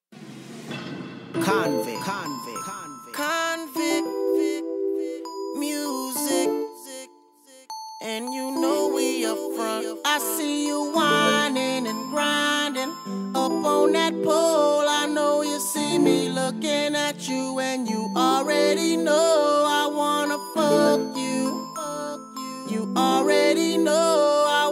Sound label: 35,33,31Hz Low Bass